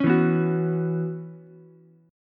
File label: Db Minor 11th Db Ukulele C6; Dbm11 strummed; 6,4,7,9; JGuitar